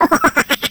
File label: skelly small